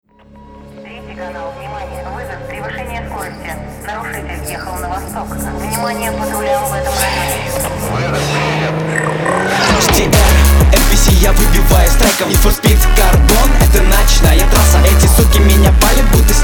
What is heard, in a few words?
Jdm Phonk